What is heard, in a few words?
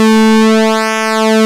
PULSEMOD, ARP Synths; E-MU Formula 4000 Series Vol. 3; Analog Odyssey; ARP Synths II